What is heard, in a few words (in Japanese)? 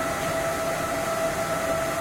monokoza middle; tetsuon